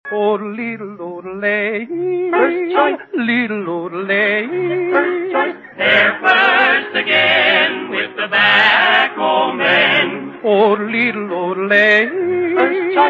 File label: Yodel; Lucky Strike; Jack Benny